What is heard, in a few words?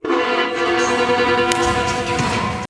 intense